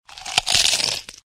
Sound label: zombie chomp; mvm forever